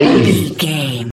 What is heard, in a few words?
troll monster hurt pain